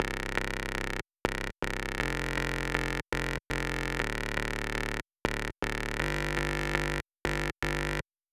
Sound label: bass